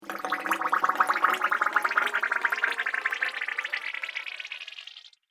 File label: Water Bubbling Echoes, cartoon; Cartoon Sound Design Sound Effects; Andrew Fleming